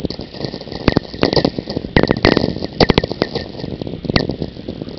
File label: tweeks